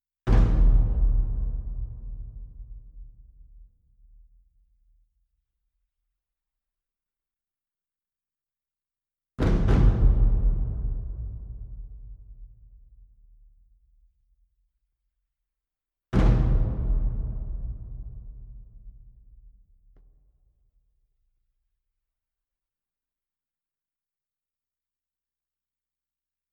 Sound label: bombo drum; blood money